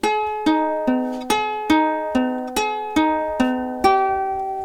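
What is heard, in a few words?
forest beginning, Ukulele Forest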